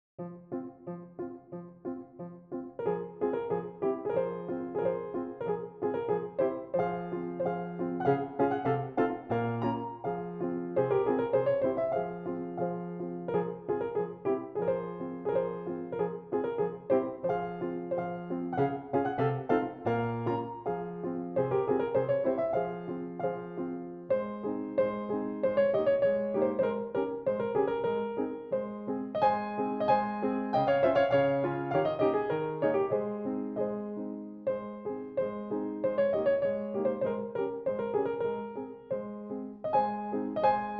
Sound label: Moment Musicaux in F Minor, pianoteq; Schubert; Op. 94 No. 3